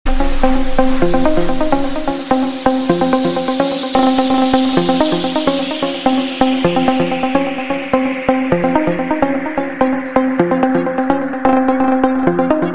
arabic house dj

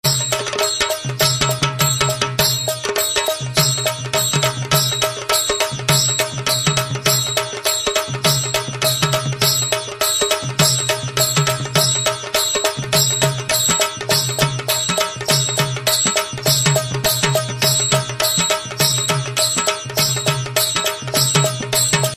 marathi ringtone

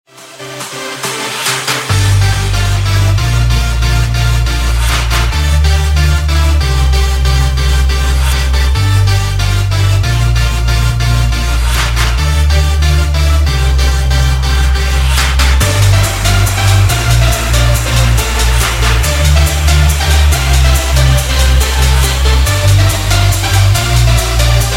one dubstep

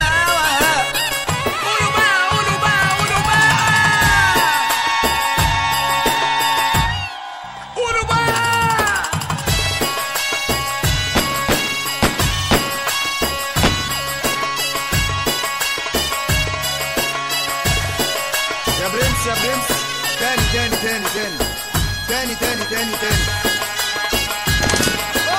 egyptian ringtone

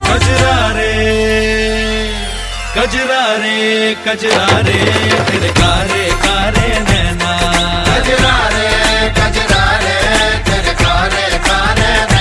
bollywood hindi; bunty aur babli 2005

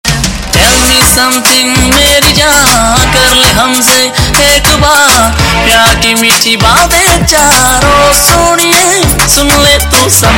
punjabi ringtone

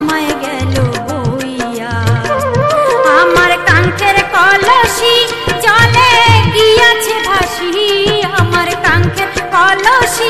bengali ringtone

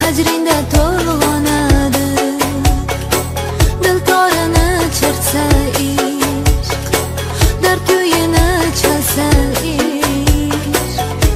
uzbek song ringtone

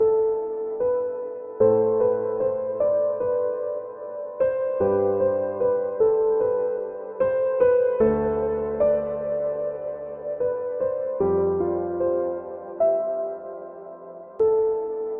piano

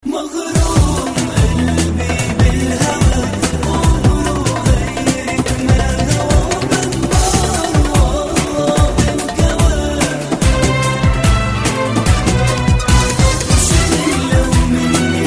arabic

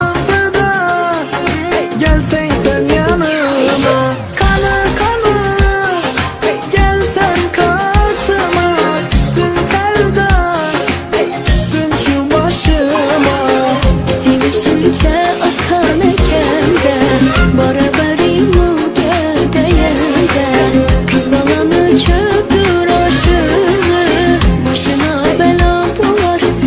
uzbek ringtone

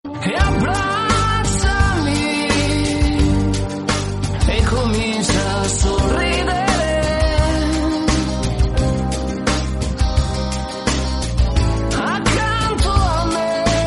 italian song

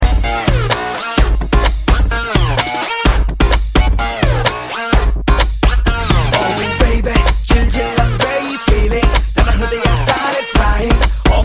beats ringtone

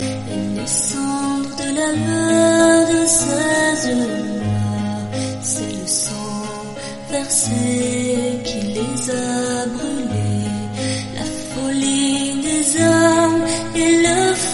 beautiful voice piano; ringtone

